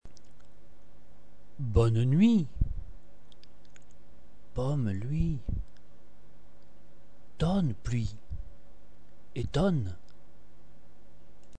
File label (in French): moto, motos